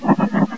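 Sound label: scuttle; Total Chaos Nukem 3d Pack; duke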